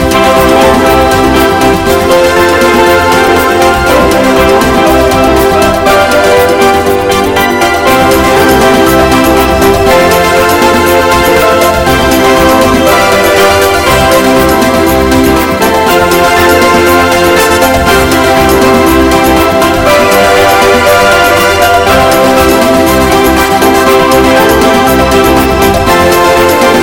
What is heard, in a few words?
Eighties Music